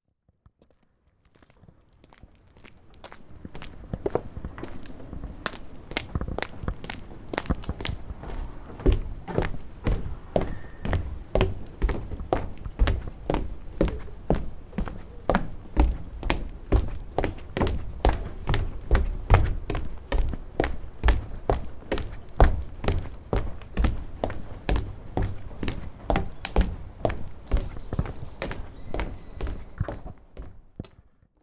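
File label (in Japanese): kairou wo aruku kigan, walking the corridor, prayer; 回廊を歩く 祈願（諏訪大社）; suwataisha; Chino Cultural Complex; 茅野市民館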